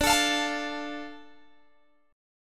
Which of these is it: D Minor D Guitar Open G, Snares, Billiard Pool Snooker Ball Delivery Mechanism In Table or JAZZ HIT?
D Minor D Guitar Open G